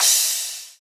soft hit finish; osu

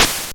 soft hit clap

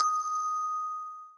bell

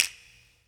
snap; osu

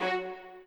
strings

